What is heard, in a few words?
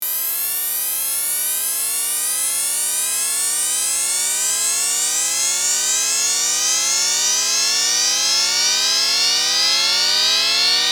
up down, Risset Rhythm; 内部的には「上がり続けている」のに、全体としては高音域から低音域に「下がり続けている」ように知覚される